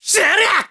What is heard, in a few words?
Sword Spin; Link; zelda sounds; Skyward Sword